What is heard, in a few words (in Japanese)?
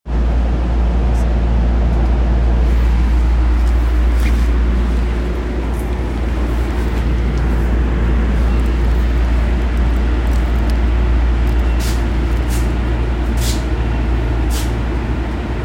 千代田線北綾瀬南